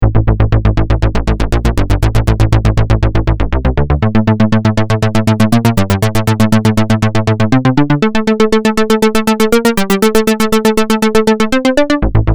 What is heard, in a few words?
synth